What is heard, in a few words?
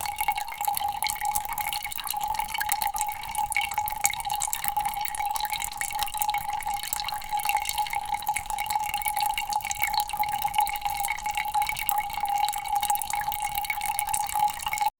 the sound of gentle water